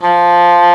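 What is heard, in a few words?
english horn; E-MU Formula 4000 Series Vol. 5; Protozoa; Proteus2 Presets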